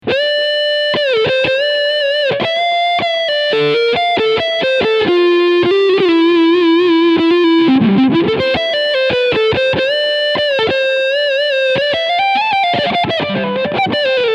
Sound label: Harley Benton SC Custom Plus EMG TBF Leadsound Front PU